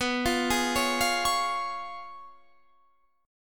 Db Major B; Db/B; arpeggiated

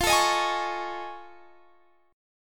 F 7th Sharp 5th Sharp 9th F; strummed; chord; JGuitar

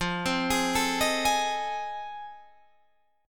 F 7th Sharp 9th, Guitar, Open D

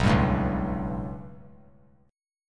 B 7th Suspended 2nd Sharp 5th down, chord; B7sus2#5 strummed; JGuitar

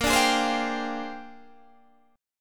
A sharp 13th, Guitar DADGAD

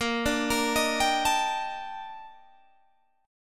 A sharp Major 13th, Guitar Standard; arpeggiated; 18,17,20,20,20,17; capo=17

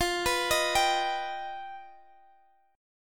G Augmented 7th

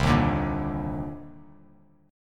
C Minor 9th, Bass Standard; Cm9 strummed; JGuitar

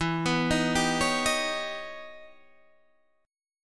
E Minor Major 13th E Guitar Open D

